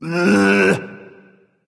tongue; smite; kuzenbo

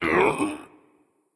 grunt lift; smite; kumbhakarna

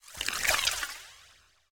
arachne spider egg idle; smite

